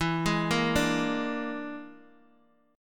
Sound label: E 7th Flat 5th, down, Guitar, DADGAD